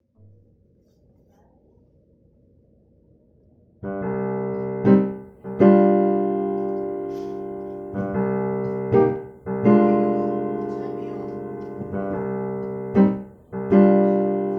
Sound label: post squaresounds jazz chords; weeklybeats-2020